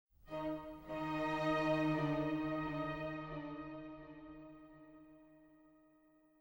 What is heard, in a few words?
MUSIC strings; Myst III Exile